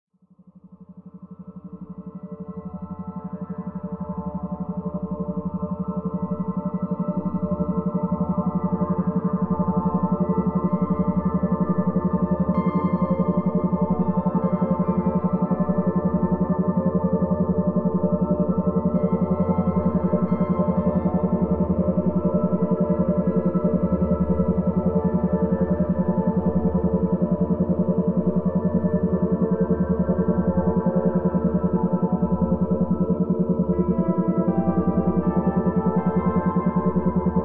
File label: theta brainwave meditation